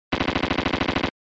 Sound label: Shooter, Shoot; SNES